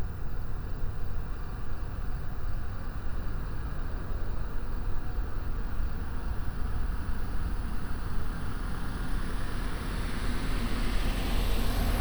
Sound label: engine B lo